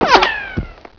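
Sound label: RICOCHET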